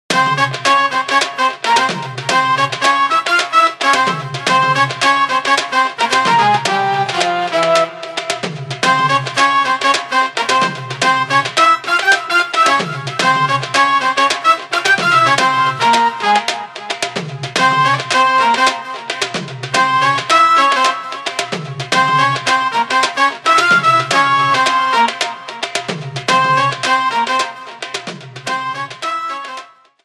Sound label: Ring Bollywood; Microsoft